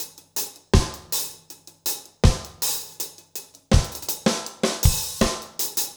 reggae

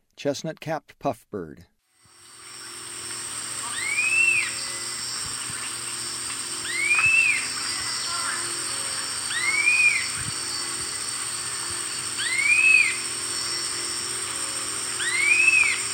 Chestnut-capped Puffbird; S. America; Eastern Lowlands

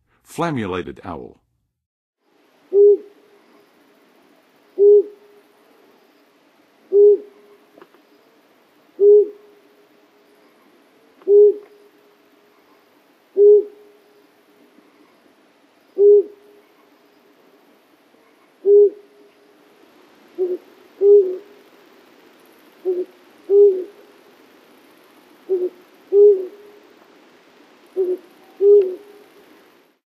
Flammulated Owl, Western USA Songbirds; North America

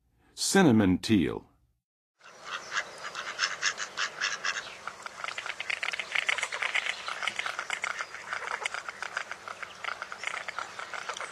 Cinnamon Teal; North America; Western USA; Western USA Songbirds

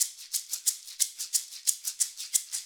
Maraca; Rock; Percussion

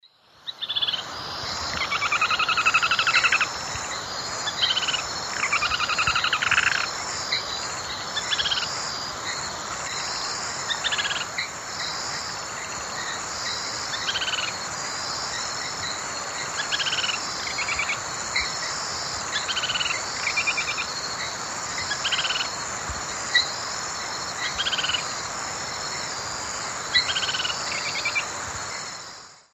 Synallaxis albigularis, 2 song types and scold; S. America; Eastern Lowlands

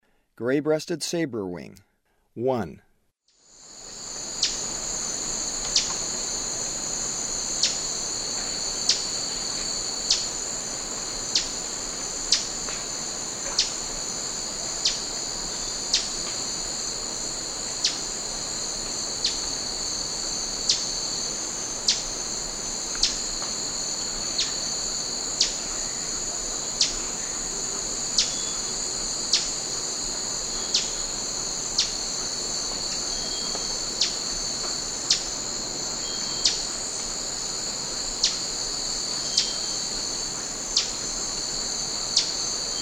Gray-breasted Sabrewing; S. America; Eastern Lowlands

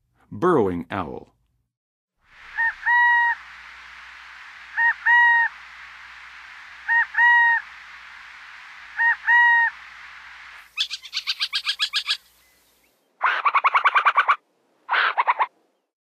Burrowing Owl, Songs of Birds of North America; Western USA; Western USA Songbirds

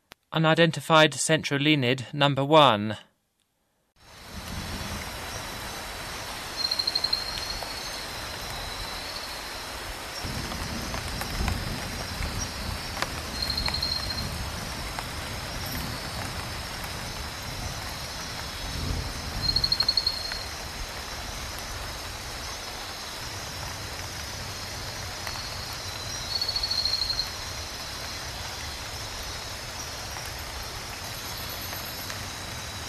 centrolenid, Frogs of Ecuadorian Amazon; S. America

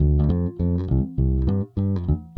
Stingray, Rock Bass; Old Grey Stingray

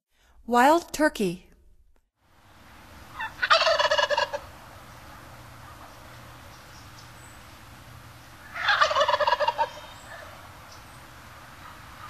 Wild Turkey; North America; Stokes

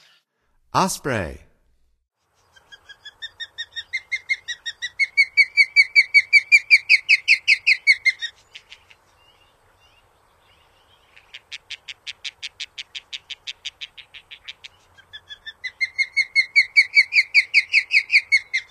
Osprey; North America; Stokes

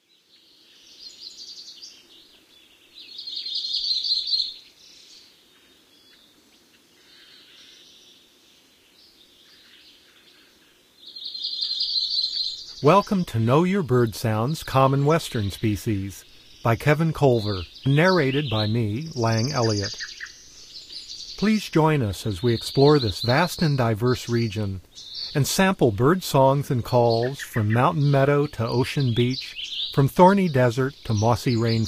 Mountain Chickadee, Bird Sounds; North America; Common Western Species; Know Your Bird Sounds

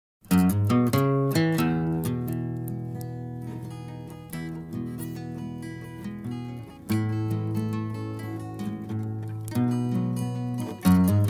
Desert Blues

